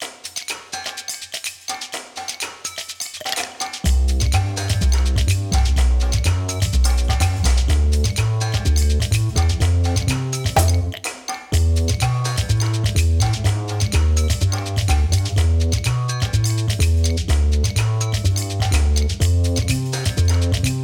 Glacier Cocktail (Drum 'n' Bass); Rayman Origins; Gourmand Land

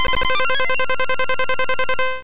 Hyper Beam; sfx; attack moves; pokemon; gen 2; gsc

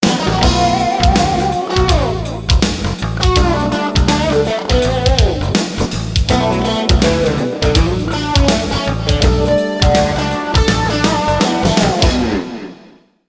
Jukebox Air Guitar; Skylanders Giants

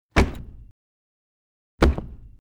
Make PVC Gun

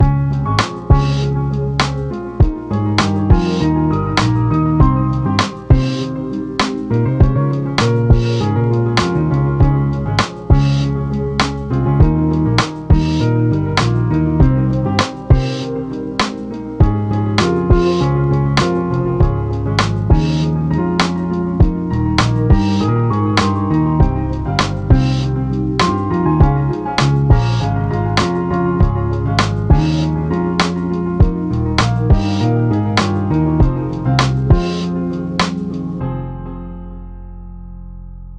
Pause Theme; Valley Of Volcanoes; Friday Night Funkin' Funkin' Origins OST mod gamerip PC 2022

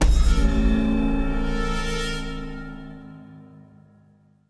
Flourish, soundtracks

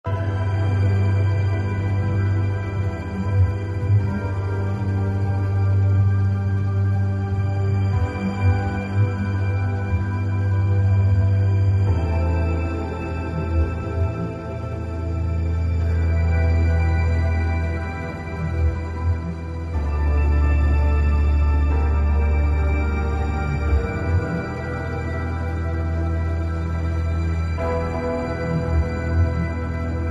Loop Suspense

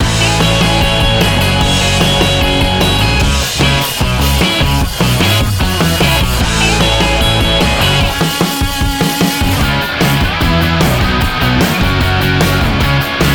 Music Punk; Learn to Fly 3

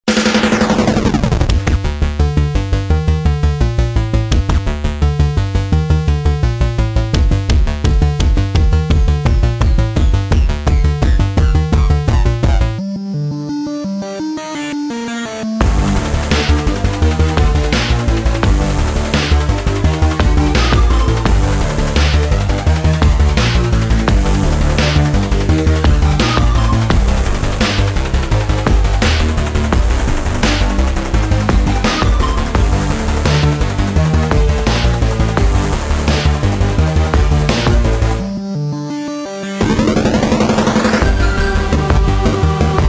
boss song; Cally's Trials

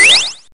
Power; hydra castle labyrinth gamerip 2011

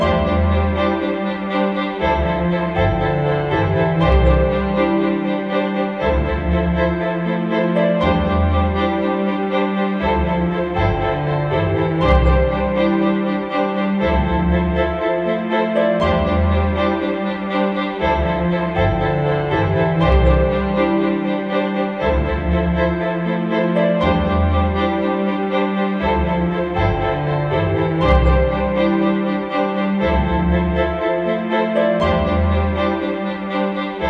Hub loop; dragonology 2009 nds gamerip

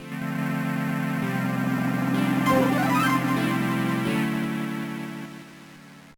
Final Victory; Wario Land IV

